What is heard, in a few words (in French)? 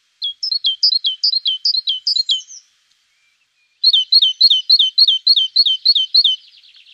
mesange charbonniere